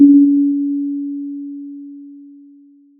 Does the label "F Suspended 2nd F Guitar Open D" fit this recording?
No